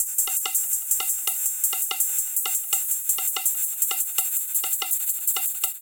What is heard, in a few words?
War Dub Tops 165 bpm; MIDI Clips; Ableton; Factory Packs; Skitter and Step